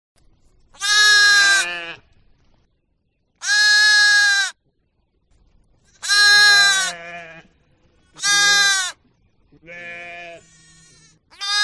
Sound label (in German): schaf; haus und nutztiere erkennen